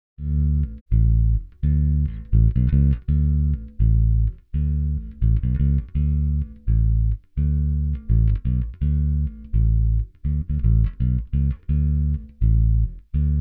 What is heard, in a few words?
Blues Bass; BMW Blues EWU